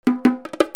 RHYTHMIC

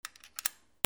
MONEY

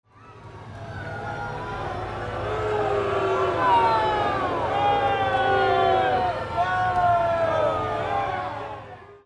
CROWDS

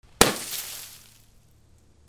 FALLING

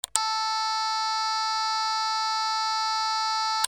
BUZZERS

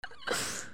PEOPLE

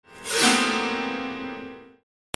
MUSICAL

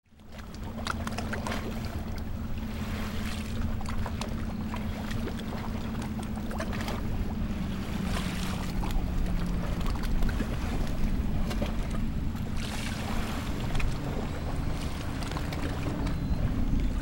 WATER

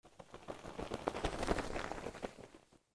BIRDS

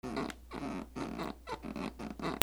SQUEAKS

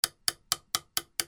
BATHROOM

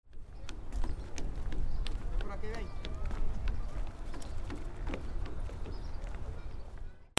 FOOTFALL

